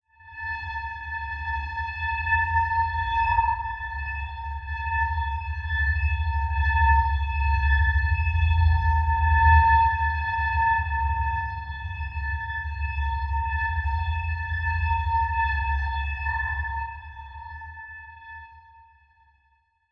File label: Title Transition Stab Dark Long; Production Element; AdobeCC